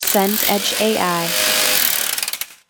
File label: jigsaw cut